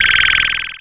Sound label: ROCK